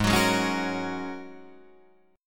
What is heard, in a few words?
G sharp 7th Suspended 2nd Suspended 4th G sharp Guitar Drop D